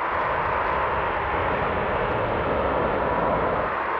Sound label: sparse soundscape samples; ReLooped